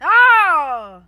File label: anger